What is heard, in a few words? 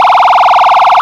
hyperyelp, sirens; code 3 h2